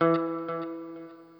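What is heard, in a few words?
NOODLE SHORT, Guitar & Bass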